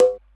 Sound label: dhol; Sm dhol 5